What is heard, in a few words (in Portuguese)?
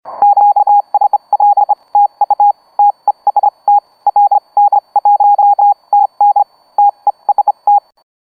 codigo morse